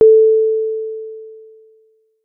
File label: Alert Tone